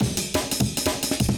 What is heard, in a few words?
new amen; Amen Breaks